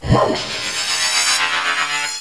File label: noise